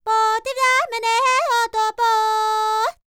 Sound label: Vocal Planet World Voices, NOMADIC